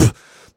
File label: Beatbox Percussive, Human Beatbox; Vengeance Samples; Special Sounds